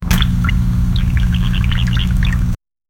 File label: fish moving, bubble